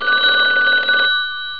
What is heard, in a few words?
phone; kidsplay